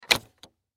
Toy Ship Landing, Toy Ship Landing Wheels Emerge Plastic; Foley; Toy Planes; Blastwave